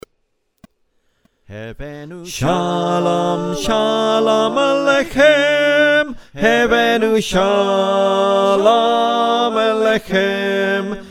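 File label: tenor, choir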